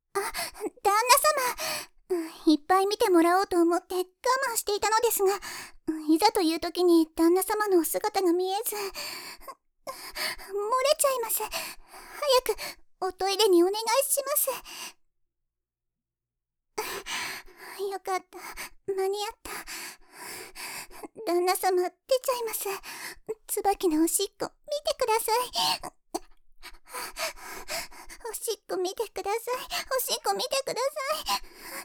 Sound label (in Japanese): おしっこ; 3人のお屋敷メイドの週末おま○こ奉仕; おしっこ2回目